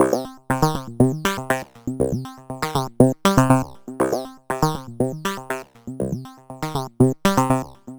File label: PROTOTECHNO